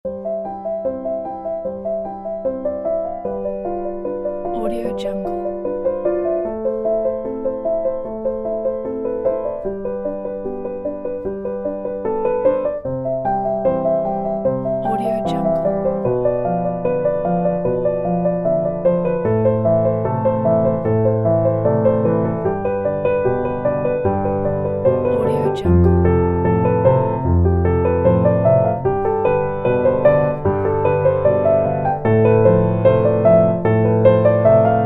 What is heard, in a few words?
tender piano etude